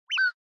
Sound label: Short Accent Low